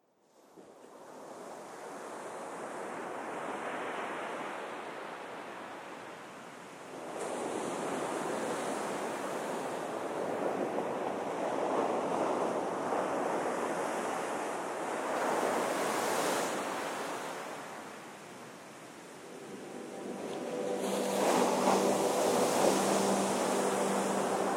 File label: Ocean Breath